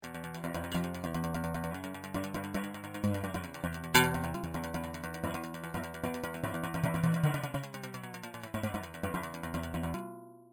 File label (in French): Guitare Stereo